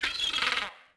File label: seagull; Ambient; MX vs ATV Unleashed